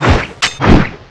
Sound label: dragon sword slash; weapons; cstrike